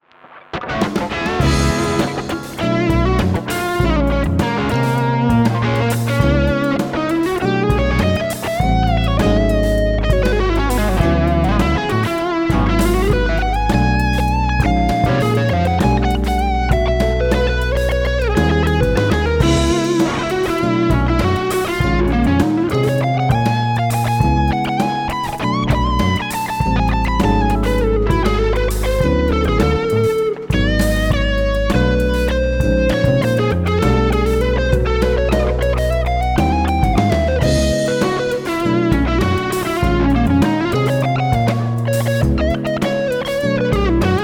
strato british higain classic; rokki; kaula; gt2

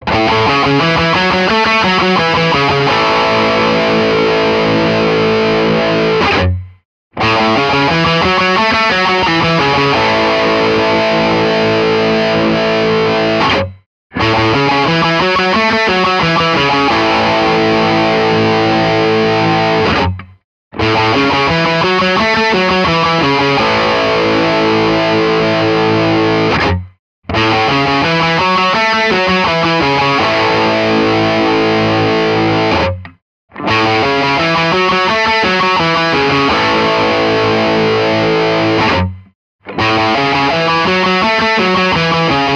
preamp tube test; budda sd30

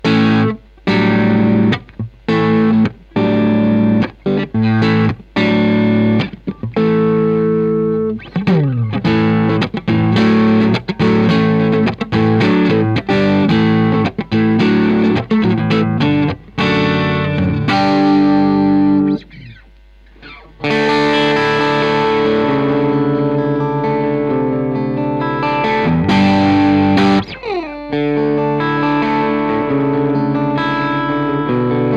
strat volume

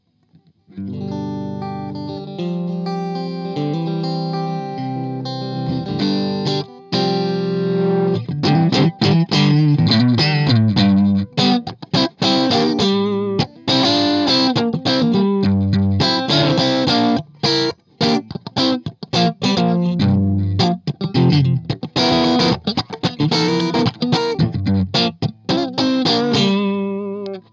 ef86 strat neck middle; bad cat black cat

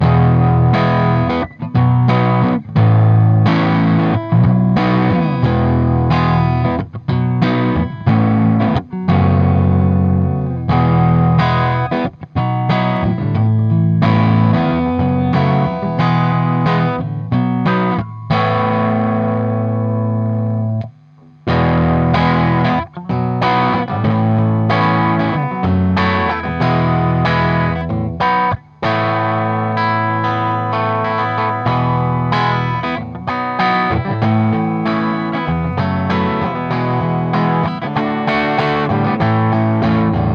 electro harmonix 6550; paateasteputkivertailu

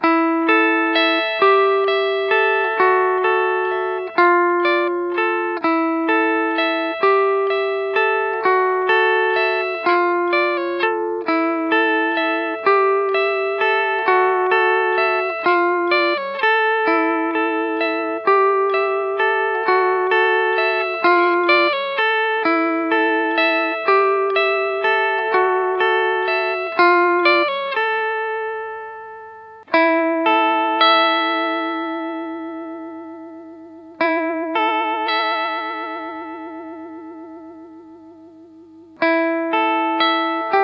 vibrato clean; mojovibe

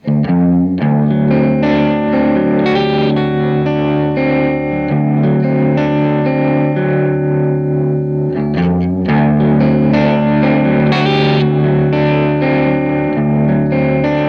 drop-d

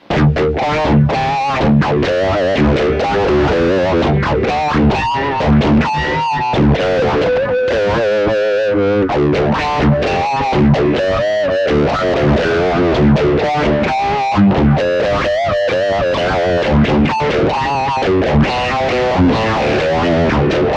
strat bridge; coron phaser55

